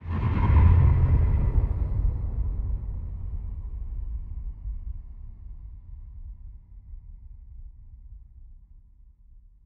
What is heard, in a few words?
ambient battle; World Of Warships